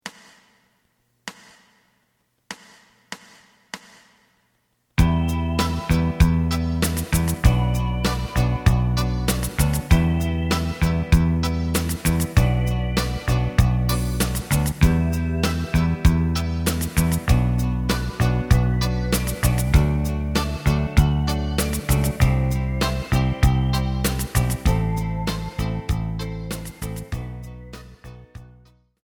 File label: lead